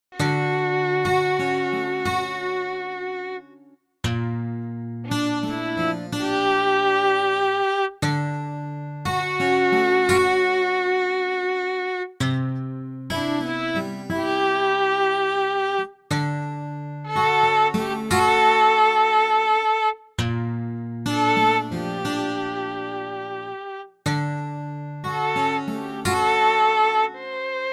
ethno improv; MOTU

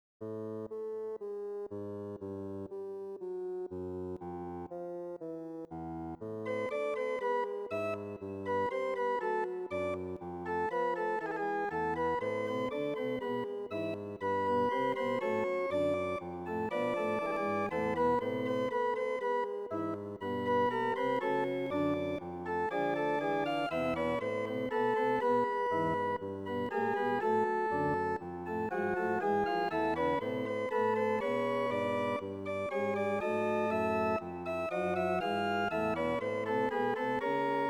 Canon 2 in 1 5th septimal 50edo

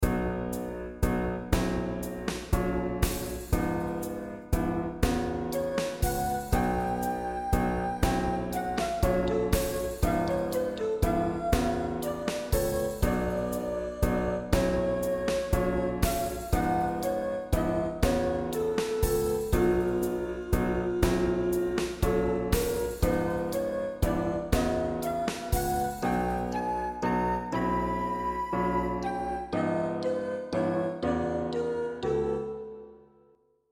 15 edt

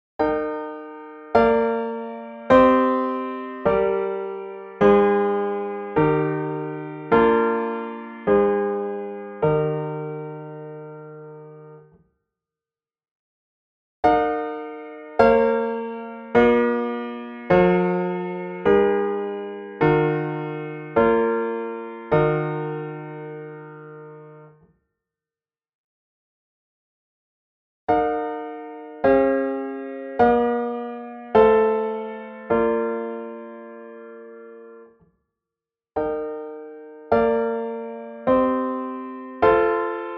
17 prog piano all, 17-ET

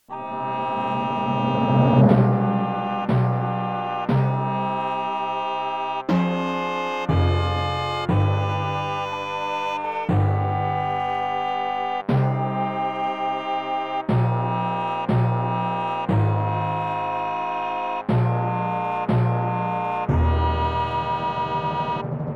37edo

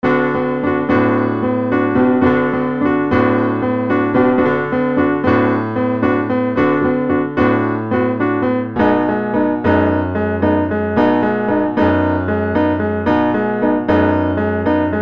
piano 15edo

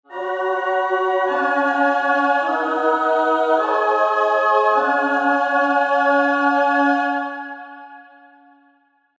17 prog choir d, 17-ET

